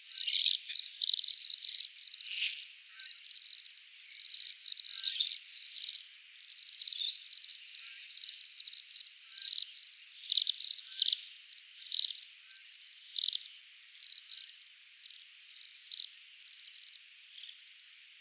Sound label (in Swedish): Mosnappa